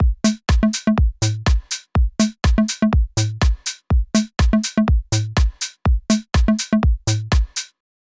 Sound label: Drums; Zen Master Demo